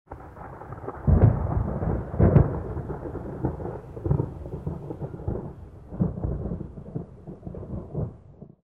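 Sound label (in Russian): далекие раскаты; звук грома; Сильный гром без дождя, гром с раскатами и одиночные удары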